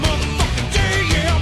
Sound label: fire, weapons